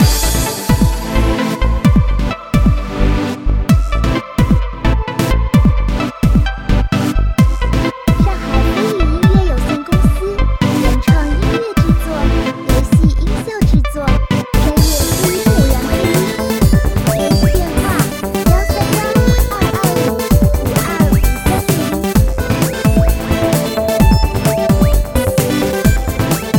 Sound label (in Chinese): 电子时尚激情; 舞台